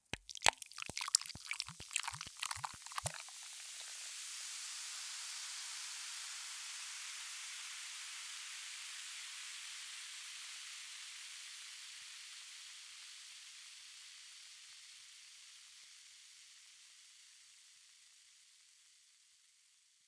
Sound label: Beer; puzzle-quest